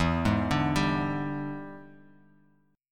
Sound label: E 7th Sharp 9th E down Bass Standard; arpeggiated; chord